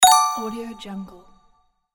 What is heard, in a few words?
game small win